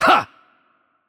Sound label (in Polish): Attack Heavy; pl PL; bloom minigame